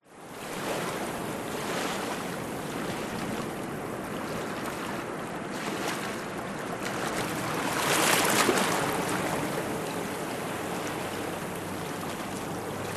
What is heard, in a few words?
nature rock pools close up small waves distant surf